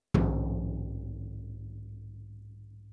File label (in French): Surdo digitale main gauche resonnant